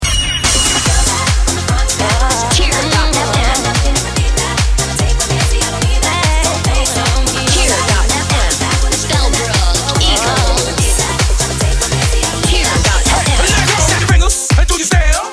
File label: Dance; Bellbrook Middle School